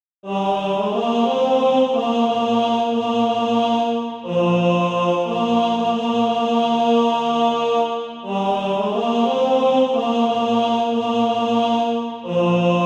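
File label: ten, Practise Music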